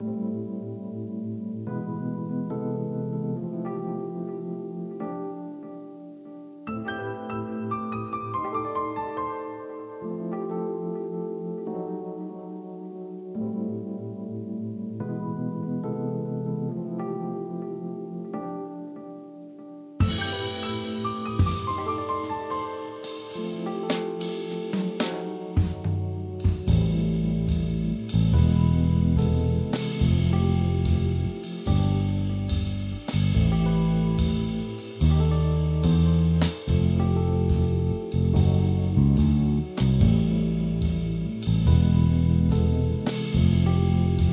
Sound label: Rhythmical Funk Vibes